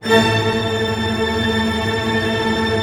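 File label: String Tremolo A, Left; Orchestra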